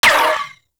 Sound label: Uzi Layer; Warframe; Tenno; Prime Tenno Uzi